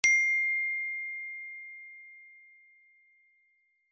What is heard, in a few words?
note ringtone; samsung sms